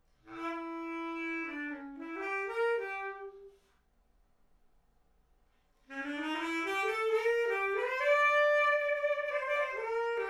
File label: Clarinet stands alone; bass clarinet